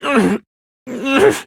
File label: grunting; kiyotaka